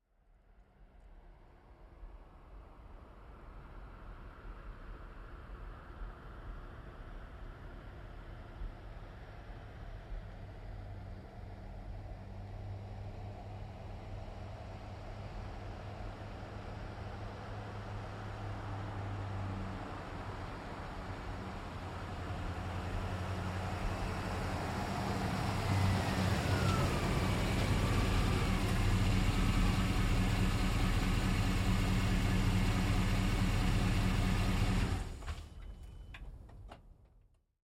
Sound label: GMC Savana, Up, Stop, Slow, Truck; Vehicles; 2010 GMC Savana; Trucks; Big Room Sound